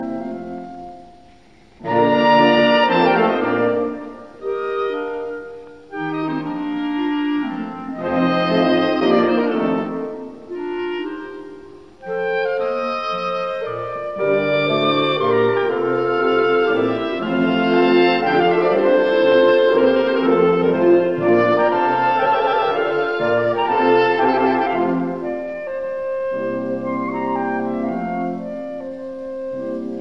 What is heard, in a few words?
Serenade No 10 in B flat major K 361 Gran Partita II Menuetto Trio I Trio II